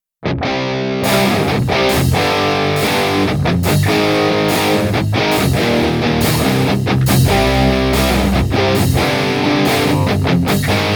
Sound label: Darkness + Bass